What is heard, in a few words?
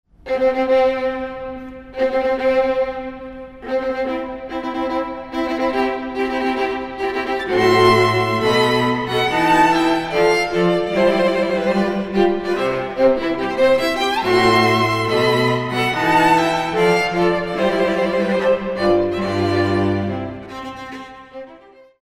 wedding march; a midsummer nights dream